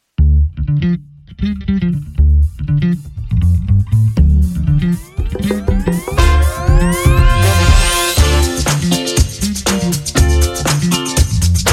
Retro Funk and Disco; Organic Loops

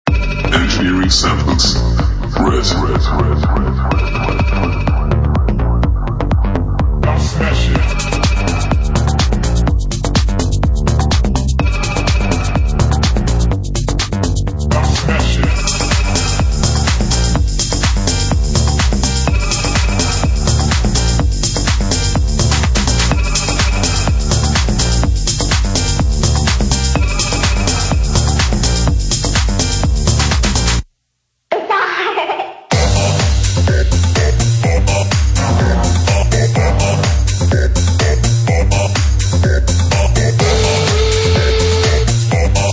House Party; Engineering Samples RED

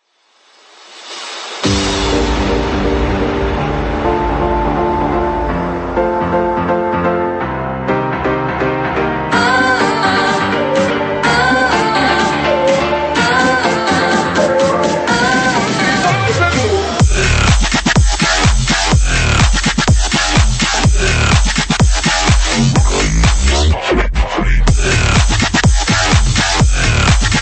Deep Future House Drums

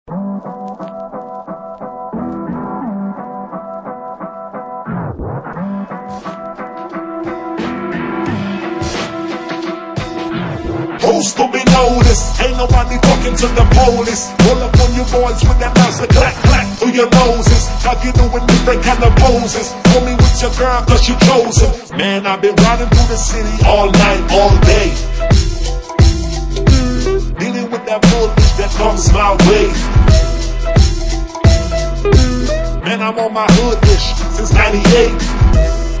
Urban Hip Hop; Singomakers

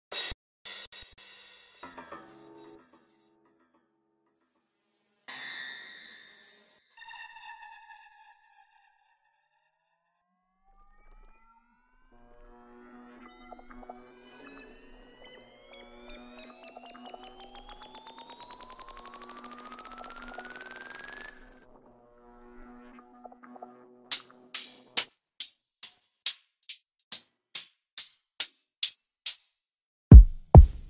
decayed drum kit

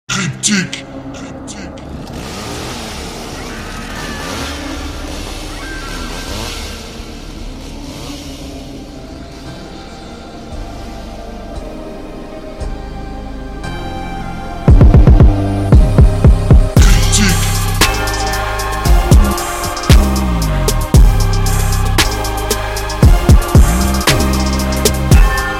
Trap; Kryptic Samples Trick Or Trap

